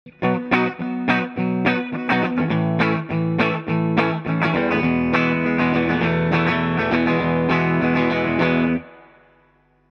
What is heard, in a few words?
E Guitar Waves CLA3A Red 5 14 Gain 5 Limiter Mode